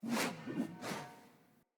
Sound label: Great Sword Whoosh Accent Air Spin; Weapons; Player; Mendicant Great Sword